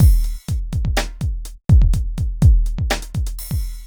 r and b

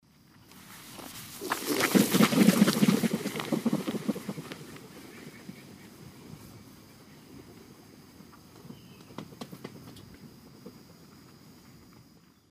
Coragyps atratus, wing noise; S. America; Eastern Lowlands

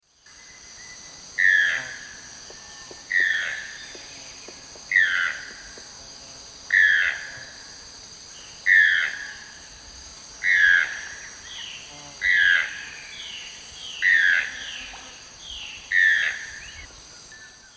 Ramphastos vitellinus, song; S. America; Eastern Lowlands

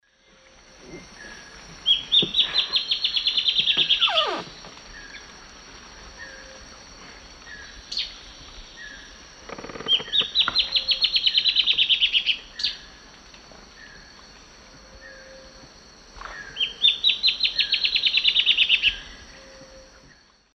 Hypocnemoides melanopogon, song and call; S. America; Eastern Lowlands

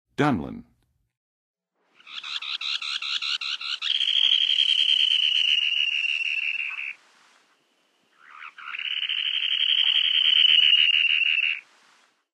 Dunlin; North America; Western USA; Western USA Songbirds

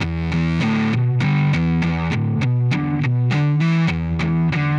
Slow Slinky Riff, Rock, Guitars

